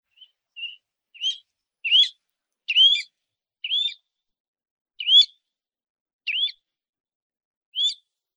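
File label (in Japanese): munaguro call, Pacific golden plover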